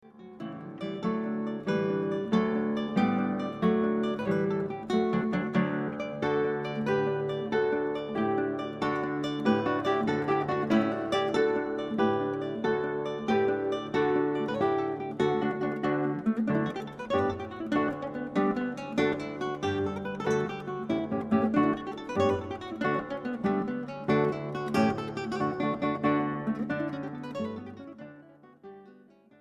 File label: pennywhistle jig